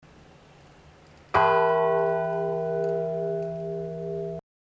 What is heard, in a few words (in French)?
cloche; St Benoît sur Loire